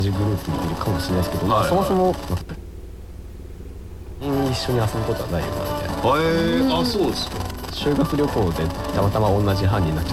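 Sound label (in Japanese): FMラジオ放送, InterFM 89.7MHz, 途中で途切れたりノイズが入りながらも受信できるラジオ放送; InterFM(89.7MHz東京タワー・東京都港区)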